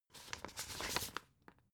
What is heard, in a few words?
old take